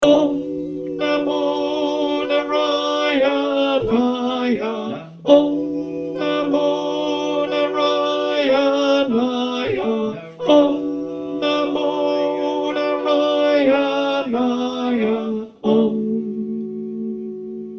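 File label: tenor, choir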